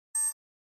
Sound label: Flash Beep HF Elect